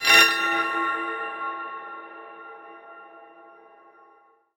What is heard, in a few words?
bicycle ring ambient hit